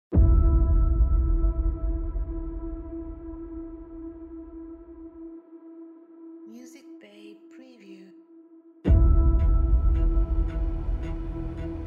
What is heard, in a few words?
Atmospheric Movie Trailer